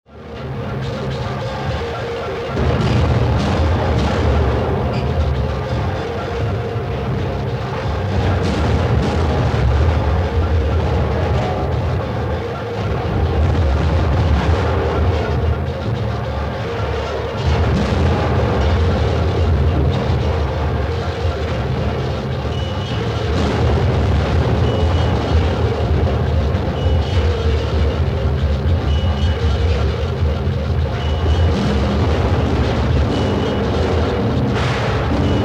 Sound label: Metal Noise